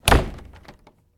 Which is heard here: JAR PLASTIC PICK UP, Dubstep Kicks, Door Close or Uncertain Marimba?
Door Close